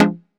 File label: stab chiff F, Noise; stabs